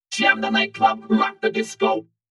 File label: 32 band; JamNight